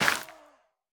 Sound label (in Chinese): 灵魂土破坏声